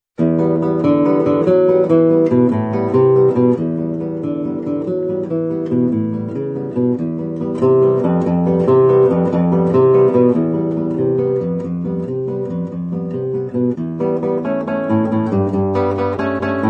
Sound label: Etudes Simples I-V Etude I, Saitenwege; Saitenwege 1